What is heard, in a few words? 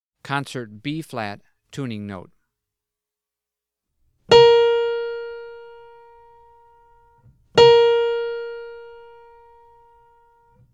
Tuning Notes; James „Jamey“ Aebersold Play-Alongs